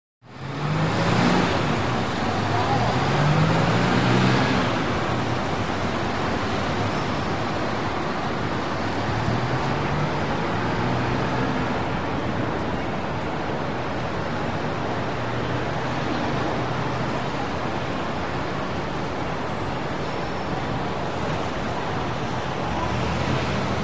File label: Wet City Traffic Very